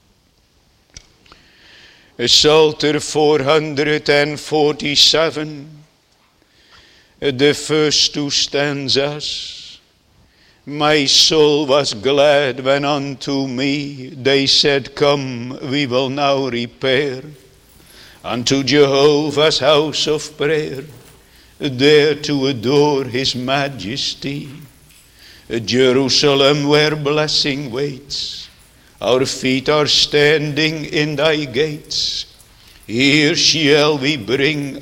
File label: Singing